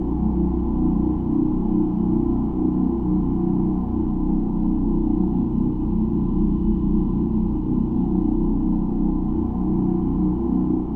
Ooo

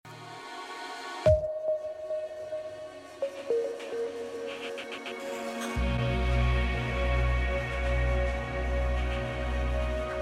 Trailer Music

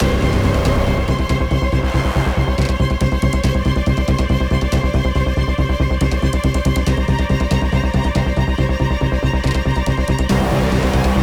Dramatic

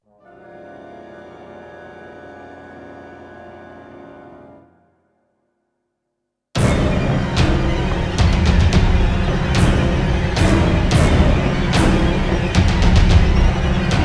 Screamer; Movie

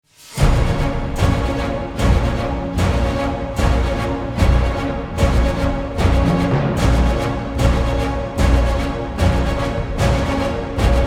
Metal Frenzy

